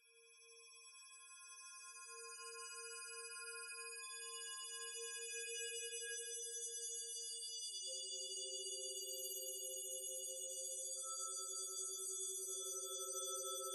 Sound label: Acoustic Tides